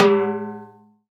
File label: Bongo; Vengeance Samples; VEH1 Percussion